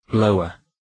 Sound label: blower